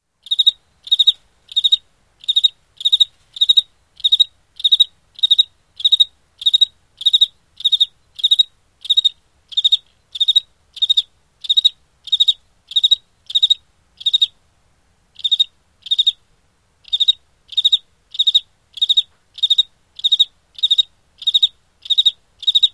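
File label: Single Cricket Rhythm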